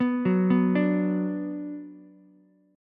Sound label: F sharp Suspended 4th Sharp 5th, Ukulele; arpeggiated; down